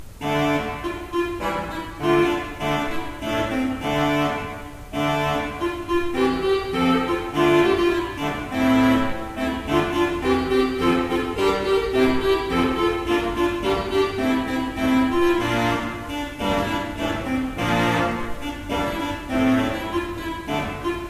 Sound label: Ott, pipeorgans